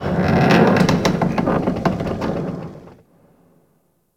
Ice Metal Creak; Warframe; Corpus Ice Planet; Ambience

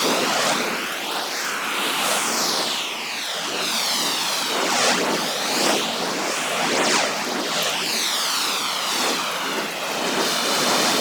Immolation Loop; Warframe; Ember

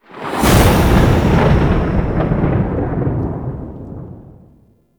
Purgatory Transition; Deadlock Protocol; Cinematics

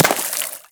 Bullet Impact Water; Warframe; Weapons; Bullet Impacts

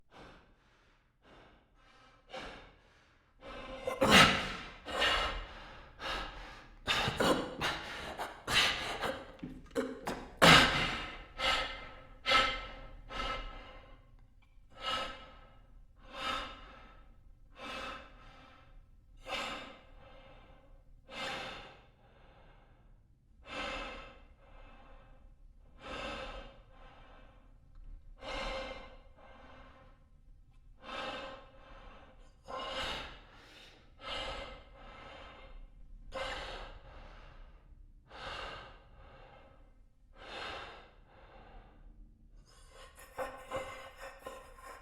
Vision Vocalizations Male; Bromius; Synod; Envoy

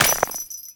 Jester Step Ice, Footsteps; Foley; Warframe; Grineer Royal Jester

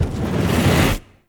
Operator Focus Blast Fireball Charge; Warframe; Operators; Attack

